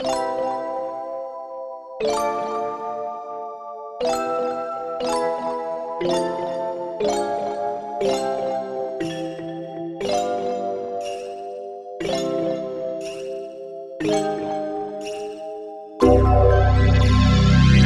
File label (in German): Orgel